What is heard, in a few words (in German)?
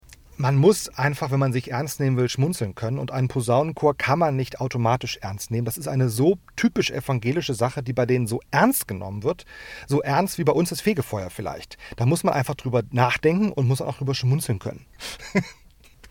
Posaunenchor; evangelischer Posaunenchor; die wunderbare welt der christen